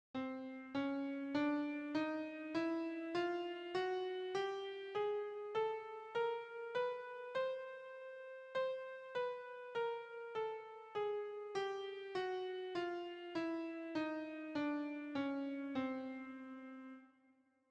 Chrom Scale

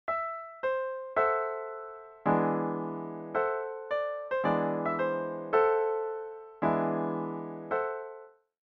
lead

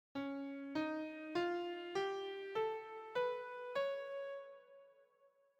whole tone c sharp

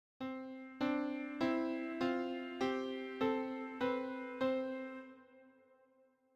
diatonic intervals

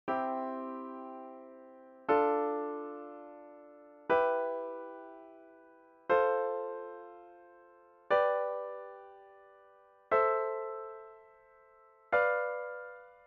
chords maj scale

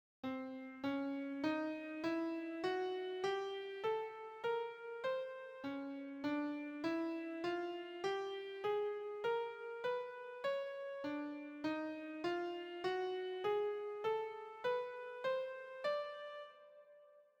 octatonic

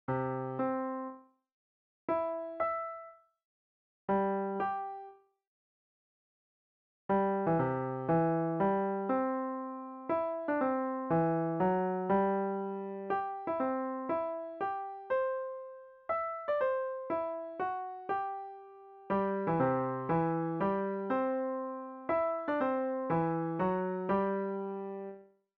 octaves anthem

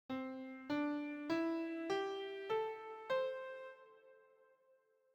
pentatonic

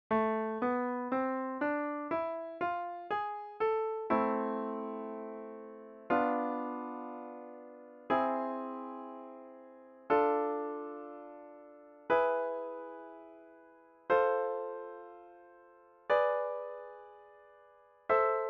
min scale harmonic

